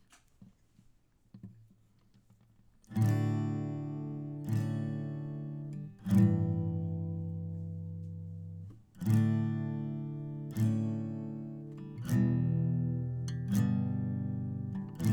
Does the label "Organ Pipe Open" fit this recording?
No